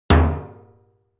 Metal 50 Gallon Drum, barrels buckets & cans

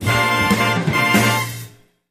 Music Effect Jazz Hi, jazz

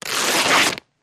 Tearing Cloth Pieces

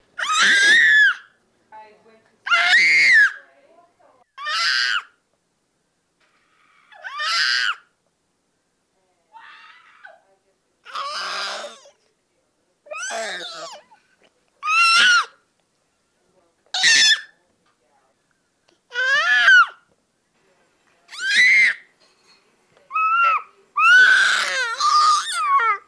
Baby Male Yells Happy

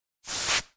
Air Hose Release

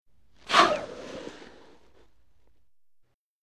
Air Release Space

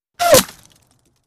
Bullet Impacts With Whir In And Impact

